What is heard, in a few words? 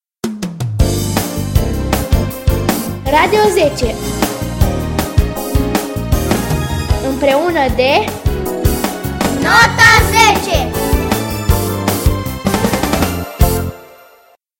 radio jingle